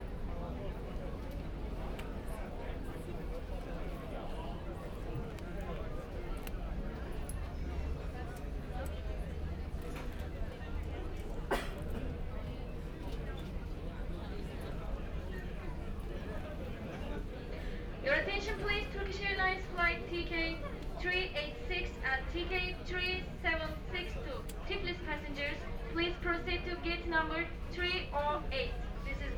Istanbul Flughafen (In Der Halle)

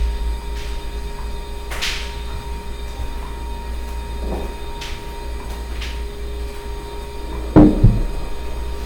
brummen; Fieldrecordings

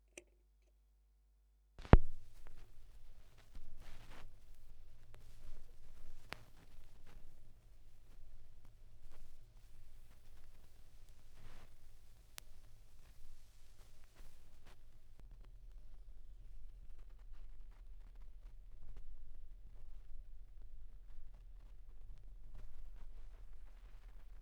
Schallplattengeraeusche, 16rpm; wendelrekords 1.2

